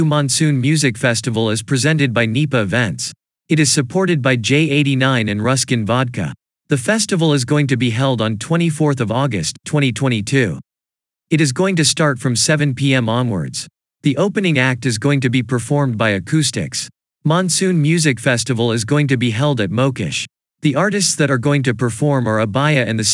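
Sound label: music monsoon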